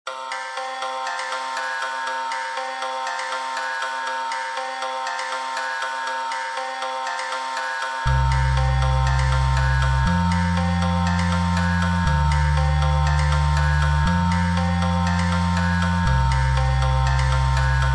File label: midi